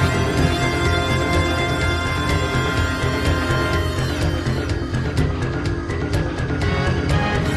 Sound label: SYNTHS